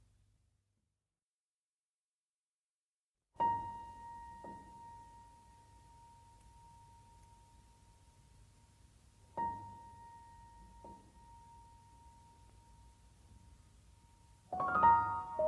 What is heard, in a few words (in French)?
Oiseaux tristes Sad Birds